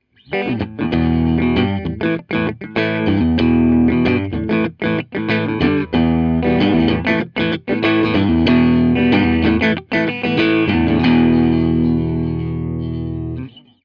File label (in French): tele medium clair